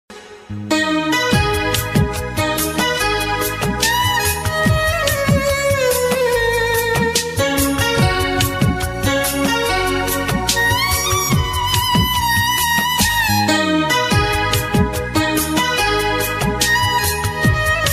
BGM ringtone; Hindi

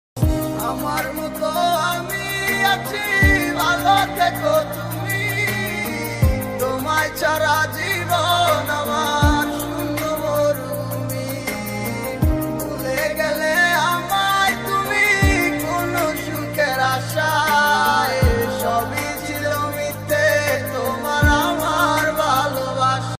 bengali ringtone

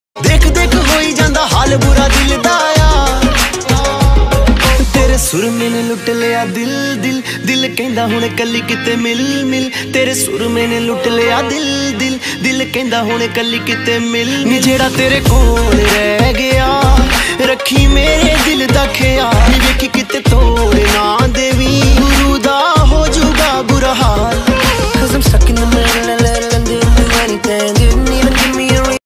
Punjabi ringtone

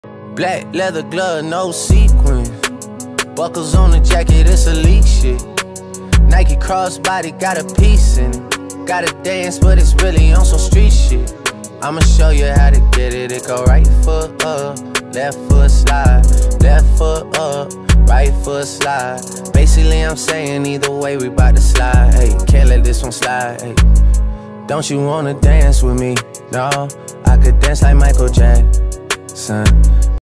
english ringtone

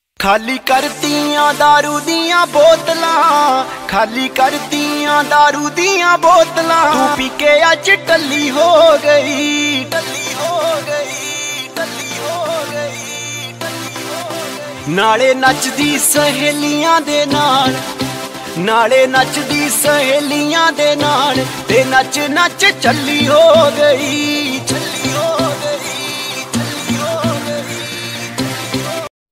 punjabi ringtone

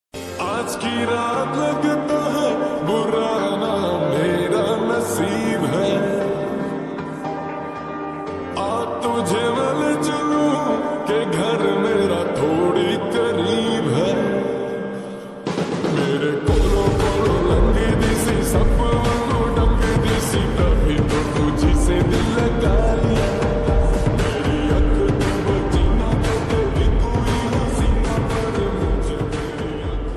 lofi version ringtone

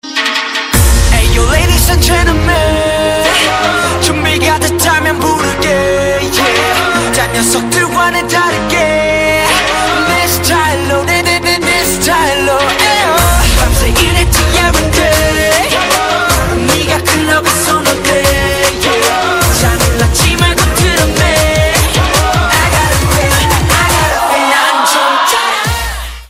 korean ringtone

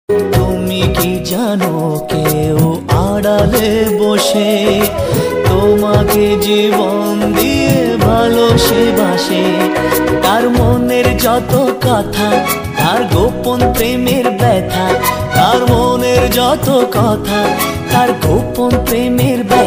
bengali ringtone

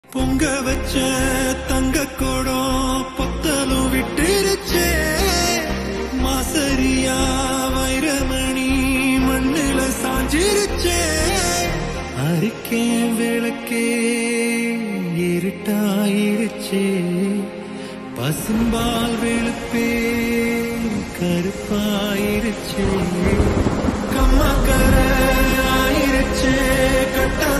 tamil ringtone

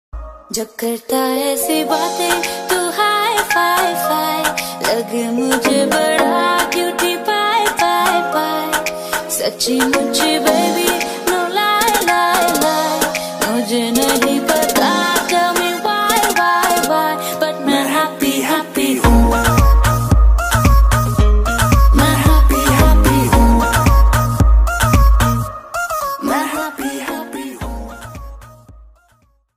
rap ringtone

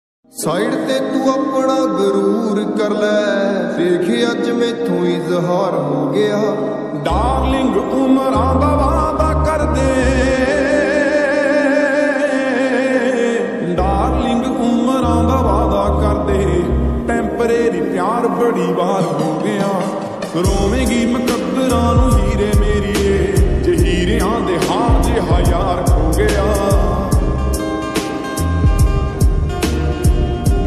lofi punjabi ringtone

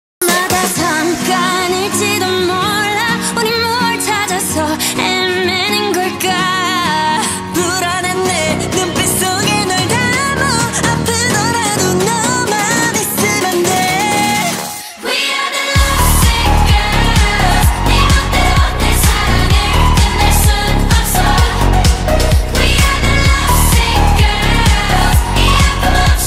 korean ringtone

